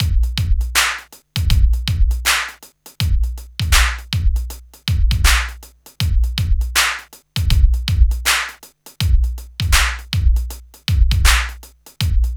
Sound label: HIPHOP, Funky; INIS; Funky Xtreams